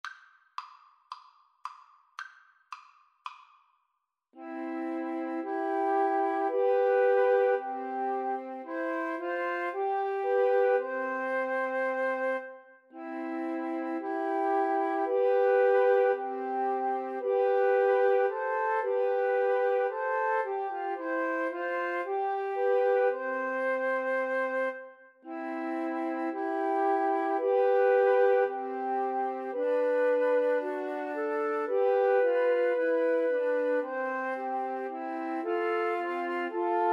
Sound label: twelve days, Flute Trio